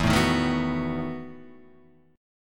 E Major 7th Suspended 2nd Suspended 4th, Guitar, Half Step Down